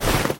move soft; scripted sequences, a1, safehouse

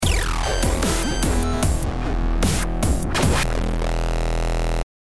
deathcam

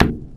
rock impact hard, concrete

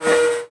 move, hinges drawers; Half Life Alyx

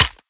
close, clams; infestation

